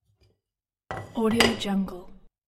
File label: household pot ceramic heavy lid replaced